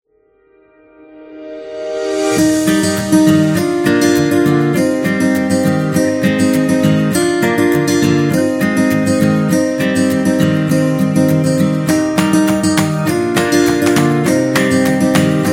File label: Food Commercial; happy kids